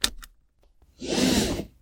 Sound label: seatbelt off; car